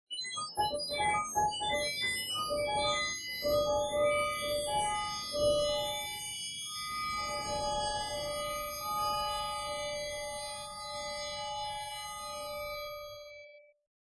piano extended, Electronic extension of the piano texture; scintillating harmonic entity; Electronic extension of the piano texture in Audio 12.; Spectralism and Microsound